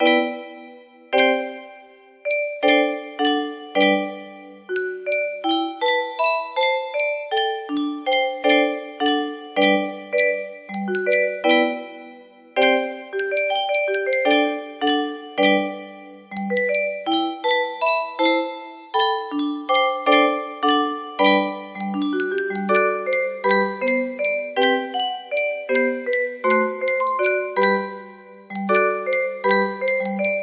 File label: music box, music box melody